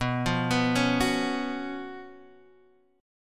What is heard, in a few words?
Gb Major 7th Suspended 4th B, guitar